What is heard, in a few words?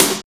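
CRISPY snare; Drums In Your FACE!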